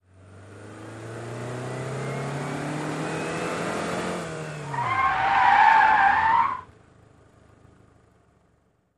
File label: Sedan 180 Rev Skid Mx